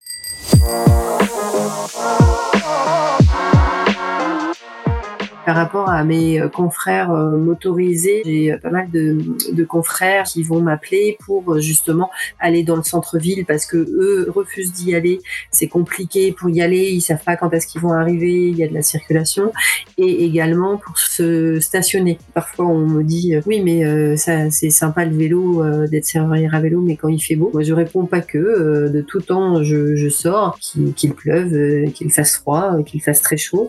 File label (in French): jingle